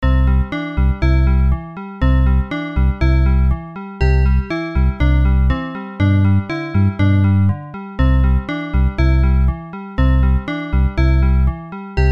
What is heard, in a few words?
PIANO